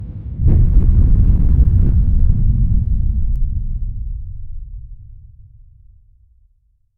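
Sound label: volcano eruption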